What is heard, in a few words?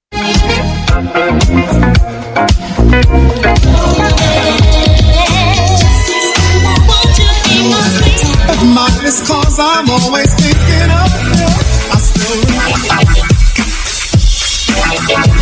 old school classics